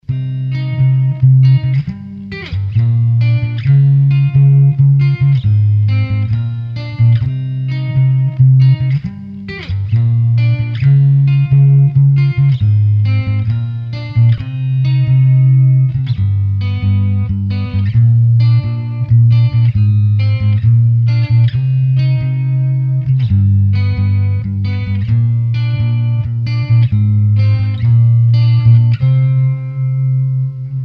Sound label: roulette rhythm